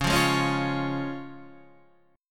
Db Major Db down Guitar Standard; strummed

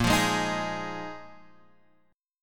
A sharp 7th Suspended 4th A sharp, Guitar, Drop D

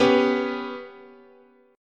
B Suspended 2nd Sharp 5th, guitar chord

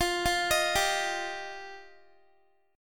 F 7th Suspended 2nd F down Guitar; F7sus2; arpeggiated

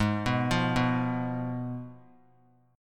Ab Minor 6th Ab, down, 3C, 3F, 3A, 3D

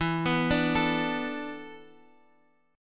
E 7th Suspended 4th, Guitar, Standard; E7sus4 arpeggiated; x,x,2,4,3,5; JGuitar

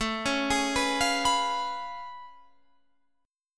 A Augmented Major 9th A down Guitar Standard; arpeggiated

